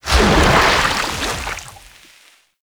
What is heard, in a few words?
Aura Ink Explosion; Gameplay; Warframe; Shadowgrapher